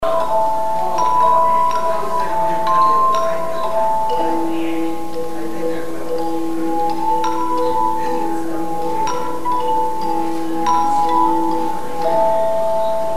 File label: chime